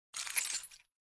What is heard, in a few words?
action jailkeys